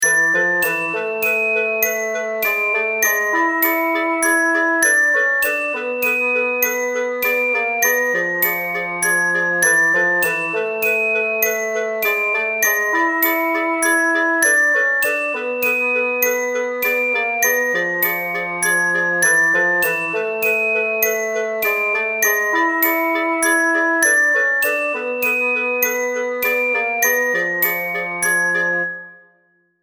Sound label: Ring Tone